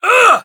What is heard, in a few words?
pain; awesomenauts